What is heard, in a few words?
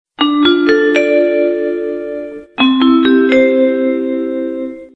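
ascending, school bell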